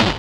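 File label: snare drum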